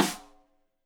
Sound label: Rim Shot Stereo; Deeply Sampled Drums; SMDrum